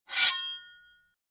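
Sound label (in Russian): ножны; Звук мечей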